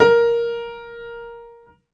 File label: Piano, Harmonic